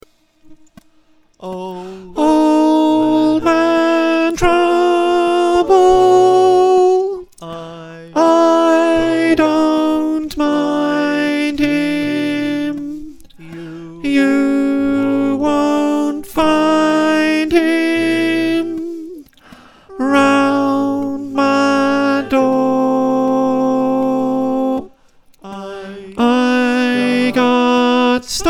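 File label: slow alto, choir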